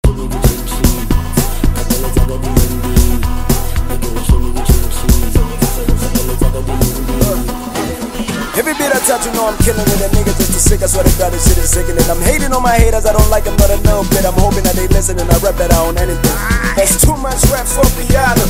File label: Freestyle Amapiano